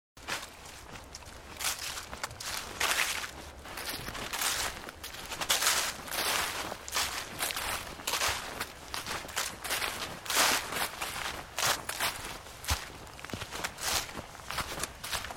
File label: Walking Through Bracken, Footsteps walking through bracken in woodland; Human